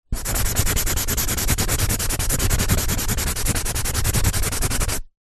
office pen felt tip scribble scrub out on cardboard sheet